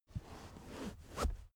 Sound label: car armrest backseat close slow soft